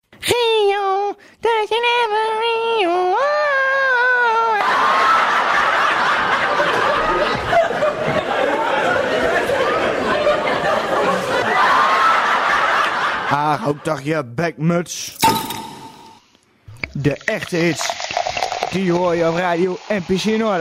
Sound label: Jingle; Audio Fragmenten; Arkan Radio 2